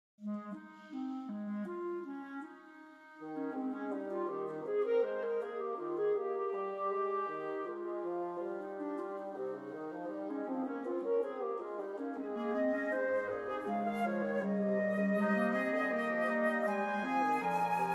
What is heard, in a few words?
fugue major for woodwind quartet; bach johann sebastian